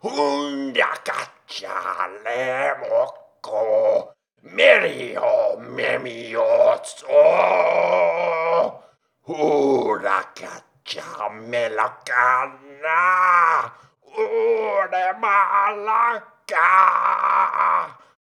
Vaultkeeper sing; Hollow Knight: Silksong

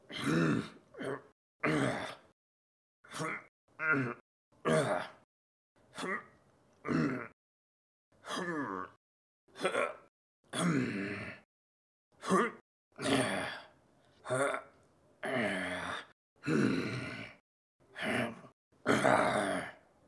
work loop; Hollow Knight: Silksong

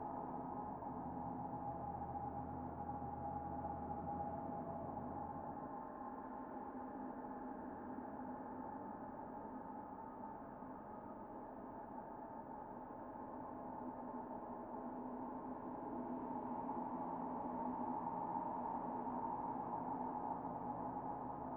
cave wind loop

